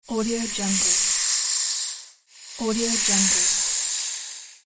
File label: snake hiss